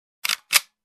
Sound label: Reloading toy gun